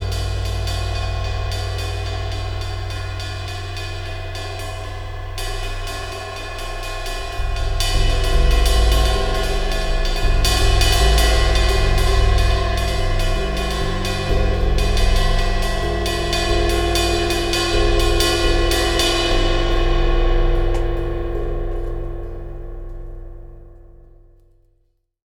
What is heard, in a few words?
Cymbal; Percussion Samples